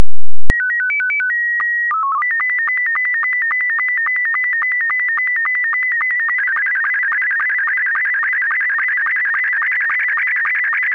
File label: Scottie 2, SSTV